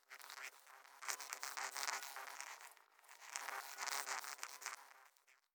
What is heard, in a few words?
Insects; We Are the Dwarves